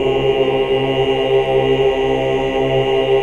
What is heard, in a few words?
OOH OCT